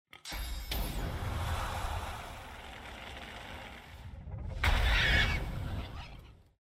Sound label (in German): Feuermagie; divinity original sin ii